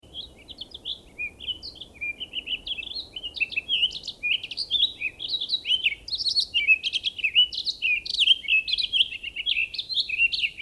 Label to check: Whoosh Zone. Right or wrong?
Wrong